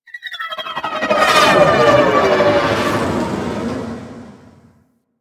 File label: Fighter Ship Attack Maneuver, Engines; Warframe; DE; Vehicles; RailJack; RailJackEnemyShips; Grineer; EnginesGeneric; Maneuvers